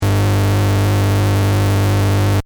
Sci fi machine loop, Scifi Machine: Loop, Short

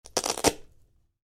Velcro: Peel, Fast; Miscellaneous Household Sound Effects; Miscellaneous Household (Sound Effects:Household); Stuart Duffield

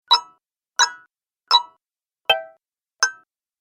App Menu - Five Sounds, Ping; Multimedia

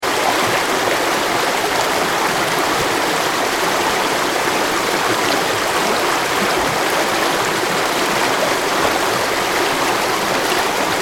River Flowing Fast; Rivers; Nature; McKinney Sound

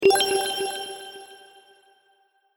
Sparkle Idea, magic sound effects

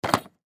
Baby Toy Chair; Baby Chair Hit Impact; Miscellaneous Cartoon; Household; Cartoons; Blastwave

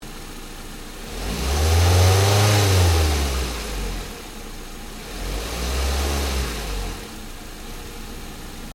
Car Ford Focus Idle Rev Engine Bay; Ford Focus hire car; Cars (Sound Effects:Vehicles)

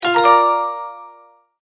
multimedia tone start

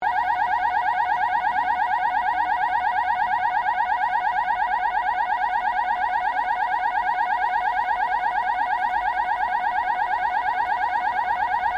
Alarm Security Shop Active Loop; Safety Alarms; Alarms (Sound Effects:Electronics)

